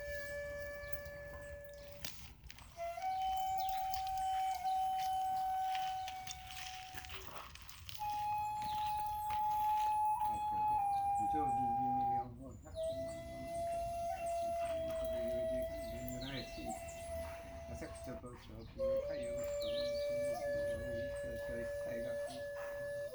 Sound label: Shakuhachi